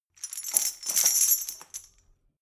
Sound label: metal chain handle clean